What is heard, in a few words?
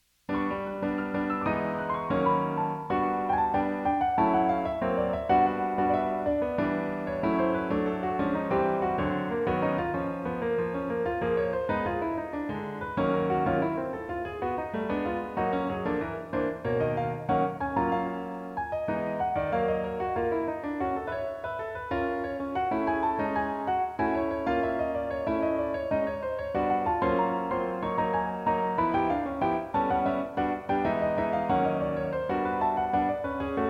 Piano Solo